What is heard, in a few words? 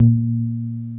BASS 2; Hip Hop; E-MU Formula 4000 Series Vol. 1; Hip Hop Nation; Big Bottoms